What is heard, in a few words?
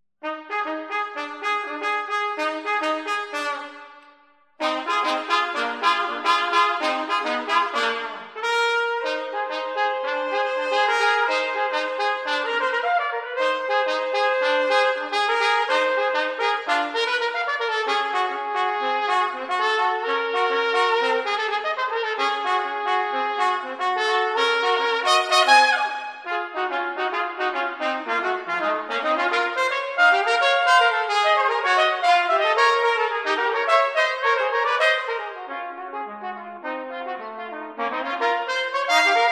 salsa